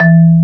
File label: MARIMBA E-2; AKAI S-Series CD-ROM Sound Library VOL-8; SET#1 C PERC